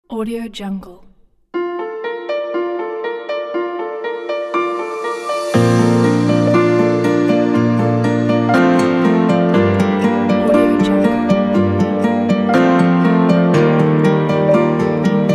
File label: cinematic romantic rain theme; denfillmusic